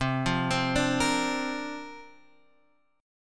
C 7th Suspended 2nd Suspended 4th, C, down, Guitar, Drop D